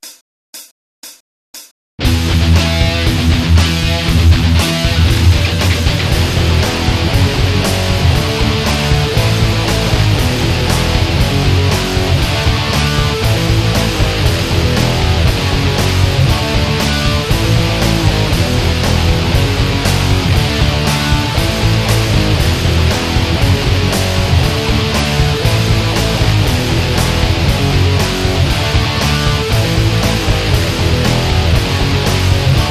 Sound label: jamtrack